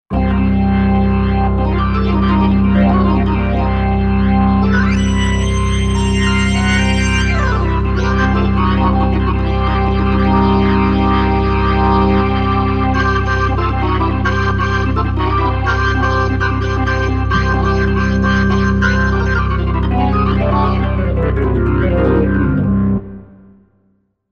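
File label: Organ Hard Rock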